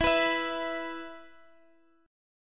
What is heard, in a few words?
E 5th E down Guitar Half Step Down